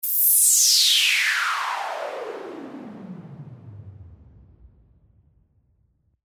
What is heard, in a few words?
Noise